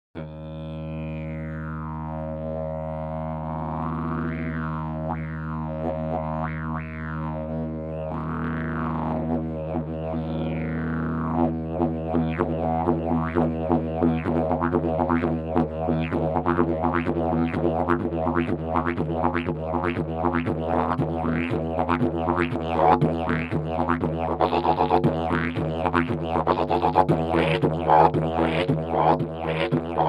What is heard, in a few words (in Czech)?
orech hnedy, didgeridoo